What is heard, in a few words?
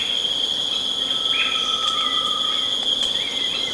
Tinamus solitarius